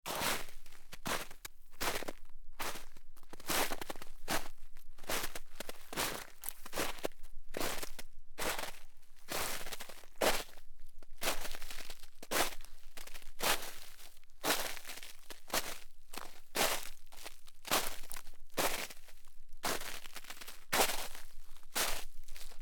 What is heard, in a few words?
Walking in Snow; Debarup Travel & Films